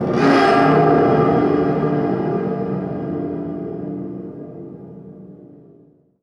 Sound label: VFX Atmos